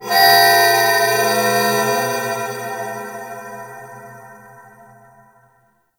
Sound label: Temple Moving Bells; Ambience; Corpus Ship; Temple One